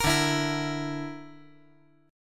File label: D Suspended 2nd Sharp 5th D